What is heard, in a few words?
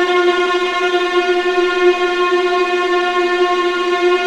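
viola E2 tremolo; East West; The Ultimate String Collection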